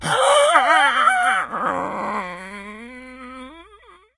moan, infected, idle moaning

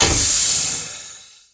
cap spin start; video games; doomsday; source engine; tf

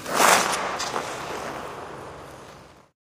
taunt skating scorcher stride

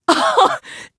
gasp; ep2; dogfight; Source engine

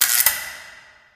light flicker; Source Engine; Portal 2

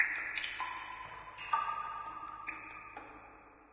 ambient water drips; source engine; portal2

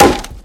wood solid impact bullet, physics

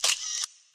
camera; Source Engine; tf

